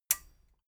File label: metal button stereo release enable